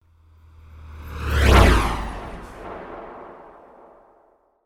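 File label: quick jump transition sound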